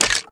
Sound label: bone drop